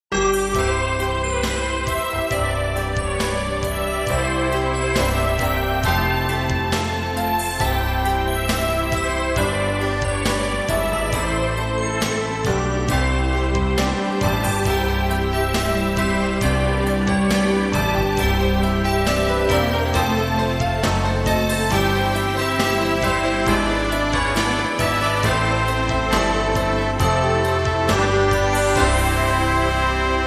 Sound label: Auld Lang Syne, Energetic